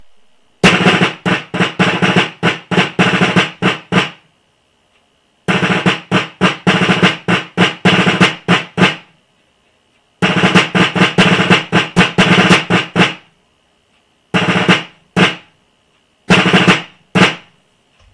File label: drummers call, fife drum